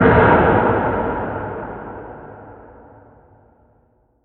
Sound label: mine hit, ambient